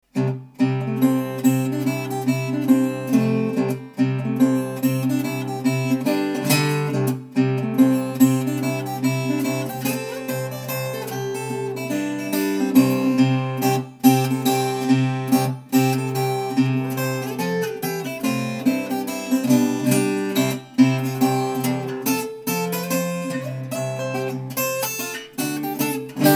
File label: Guitar